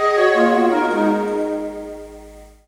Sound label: Repetoire Flute; M8